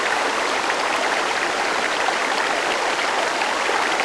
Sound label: river slow; Star Wars Knights Of The Old Republic II; XBOX